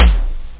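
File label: electric kick; OctaMED SoundStudio V1; jazzies samples; perc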